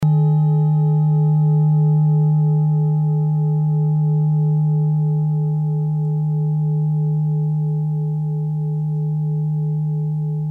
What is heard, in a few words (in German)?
klangschale orissa